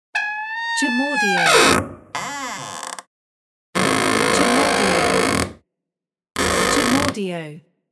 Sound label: Old Door